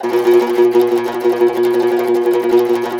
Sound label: CUMBUS Tremolo